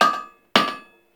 Village Hammering One Off; Warframe; Eidolon Plains; Ostron Village

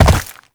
land ice; Movement; Warframe; Uriel

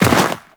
Walk Snow, Footsteps; Warframe; Characters; Tenno

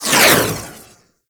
Rifle Alt Projectile By, Weapons; Warframe; Tenno; Gyre

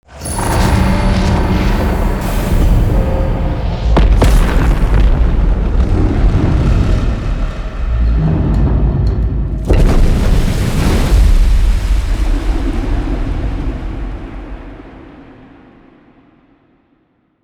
Crash SFX; Collector

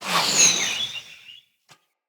Hunter Bow Ranged Arrow Projectile Start; Weapons; Player; Bows; EnvoyHunterBowRangedMelee

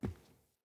Boot Walk Wood, Footsteps; Characters; Enemy; Boots; Sinecure; Melee Grunt

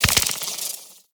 Mortar Mine Drop

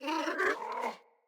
Puppy Idle Speak Playful; Enemies; Vocals; Kubrow

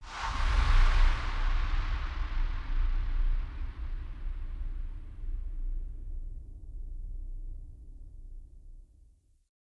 Wake Up Loop Intro Stinger End; Cinematics; Bromius; Bromius Quest Wake Up Loop

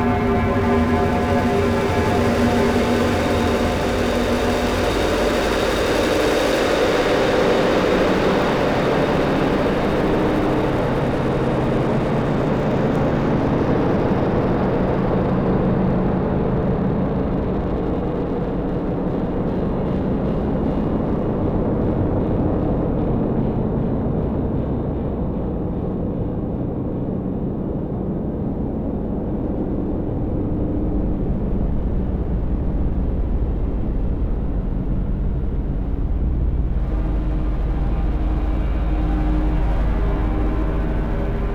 Bed Loop, Interior; Warframe; Shadowgrapher

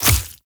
Short Sword Medium Impact Flesh; Weapon Impacts; Player; Swords; Combat

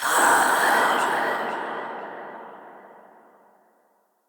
Ghost Pain, Vocals; Characters; Enemy; Nightfold Test Range Ghosts

